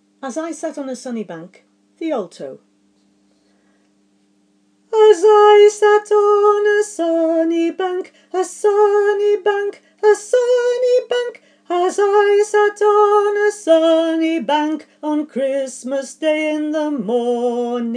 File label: As I Sat, alto